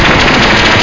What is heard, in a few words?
Engine room